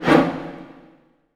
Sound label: HIT, Orchestral; Orchestral Loops; 128 PSYCHO